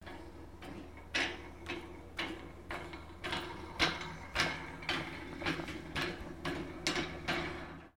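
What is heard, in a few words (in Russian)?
walking on metal stairs sound effect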